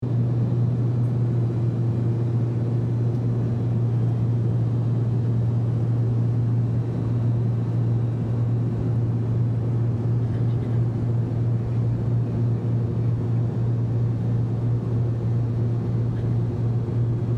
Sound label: Airplane interior perspective take off; vehicle